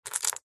foley gemstones several small opal amethyst jade pick up from surface